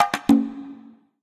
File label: Taiko, notifications; Honor; MagicOS 7.1